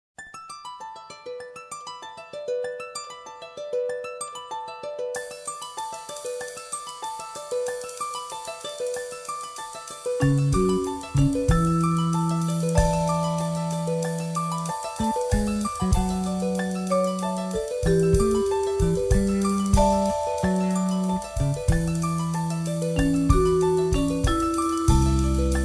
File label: Harp